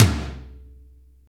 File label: TOM POWER, Drum Kits; Roland L-CD701; LA Fat Kit 1